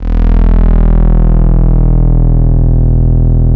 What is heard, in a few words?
TAURUS; Greytsounds VOL-1